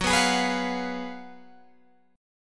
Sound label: Gb Major 7th Suspended 2nd Suspended 4th Guitar Open G